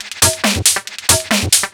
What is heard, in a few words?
drm 138, Techno, drum-loops; Techno ID; loop-lab